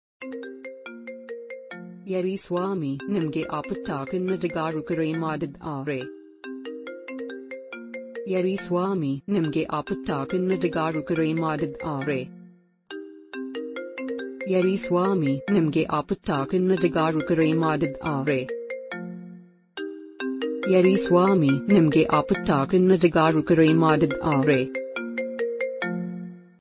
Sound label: kannada ringtone